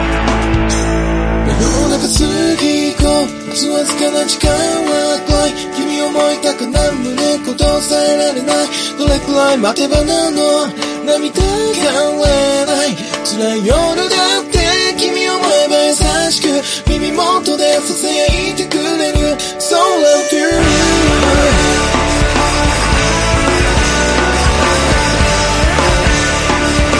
oppo limpid bgm

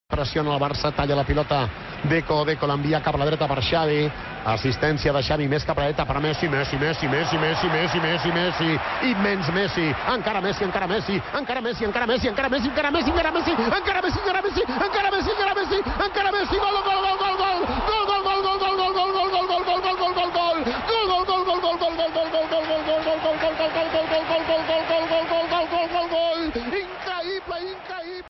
malayalam song

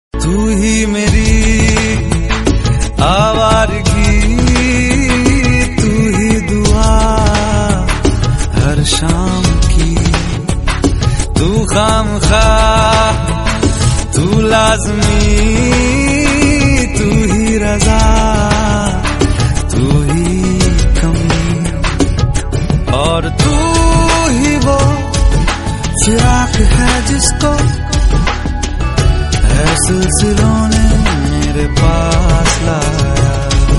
flute

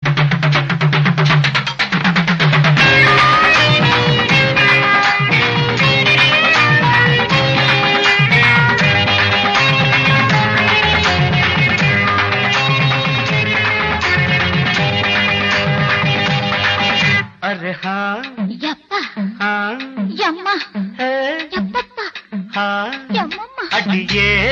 piano bgm background music; manam

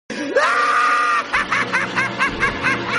laugh horror notification; joker movie

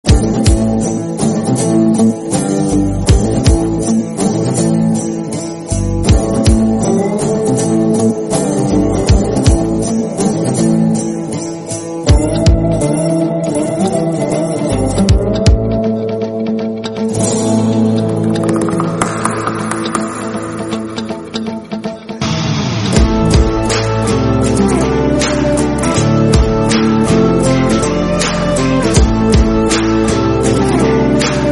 bgm; chennai 28

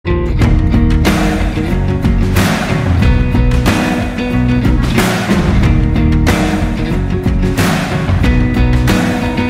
Instrumental Music Bgm

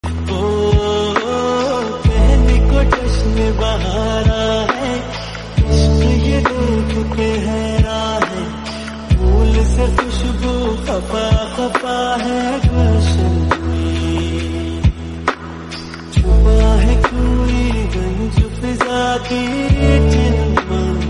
lofi remix

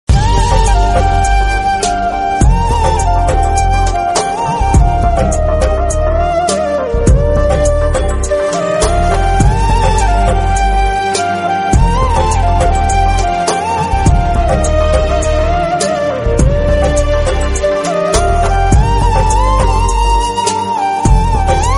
sad background music flute

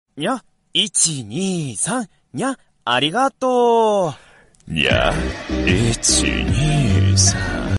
anime korean song

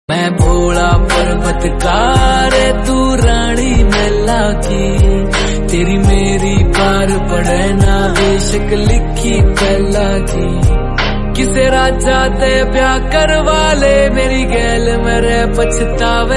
bhakti devotional Hindi

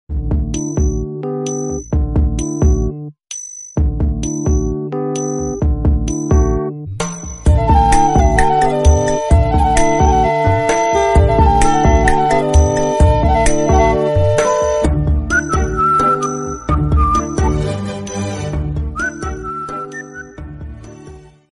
bgm

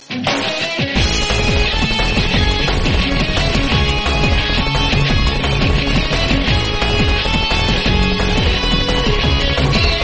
no copyright gaming music

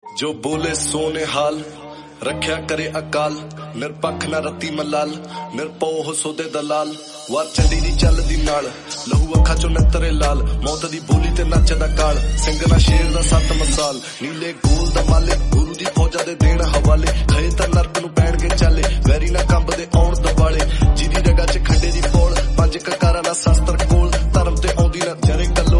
rap punjabi

